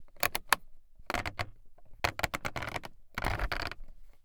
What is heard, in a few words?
renault master 2.3 dci foley interior radio knob turn; Soundholder